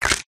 cartoon bite single crunch; zapsplat

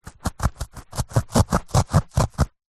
paper tissue cutting with serated knife; foley

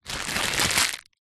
foley packaging plastic small movement

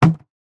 industrial traffic cone drop; sound effects one

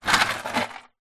household freezer ice cube plastic tray close; zapsplat

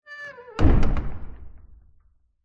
horror dungeon door close

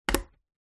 crutches on wooden floor single step; foley

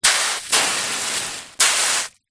household water sizzle dripped on iron hot

